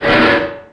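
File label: Collision Interior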